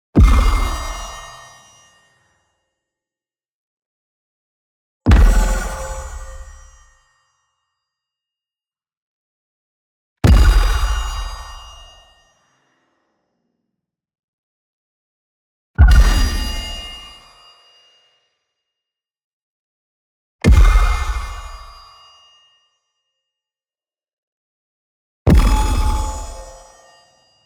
PING Reflection Breakpoint; boom library; Neon

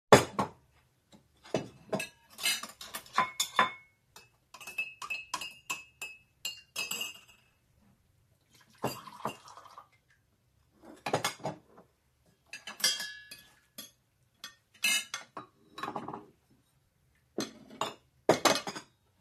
dishes clattering background; DeadFright

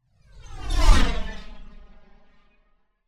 Space Ship By - Very Fast, Light Metal Clanking; Hollywood Edge; sound designer's toolkit v2

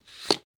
card draw; dice, cards, game related; NS Studios - ultra sound collection

